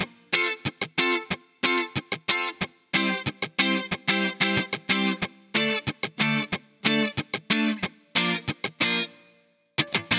guitar loop electric reggae pop 92 F; ANDRS MRCIO

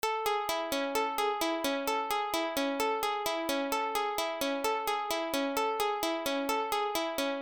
Pluck 122 C

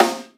snare acoustic

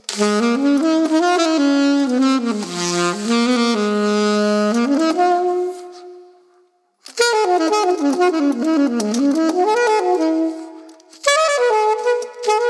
JAZZY bpm 125 Db Maj

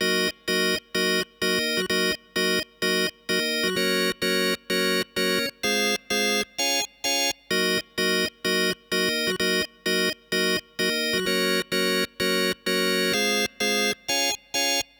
Combo Organ, C-, 128

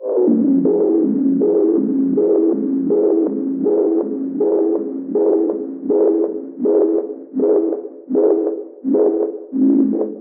synth pedal noise Ab min

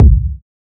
Kick; Turn Up

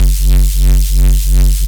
bass stab white noise F

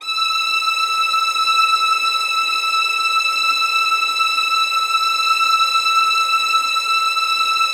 124 Am Synth Strings

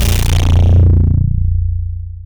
hitech sub bass 808 robotic cyber

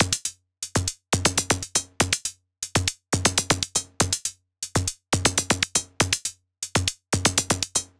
Analog Techno Sequence 120BPM Analog Machine

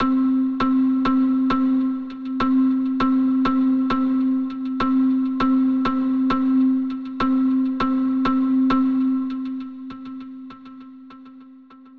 chill 100bpm Cmaj

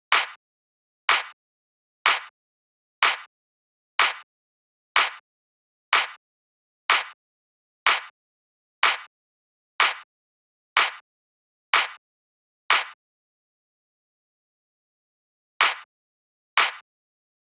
Drums Clap 124

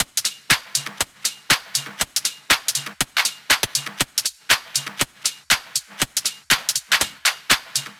120 DRUM LOOP TOP

